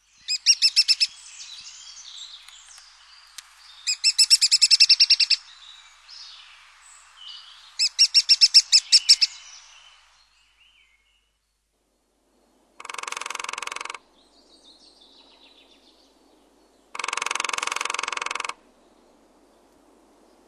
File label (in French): Pic epeichette, birds